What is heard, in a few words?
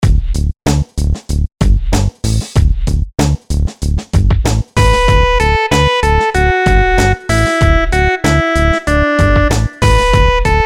melodies simple syncopated, Dmaj; melody